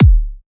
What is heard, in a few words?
Kick - C#; House; Drum One Shots; Venom Kick